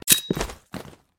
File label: granade pin